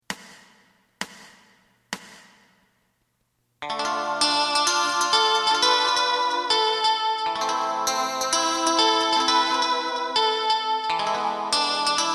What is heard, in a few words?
drum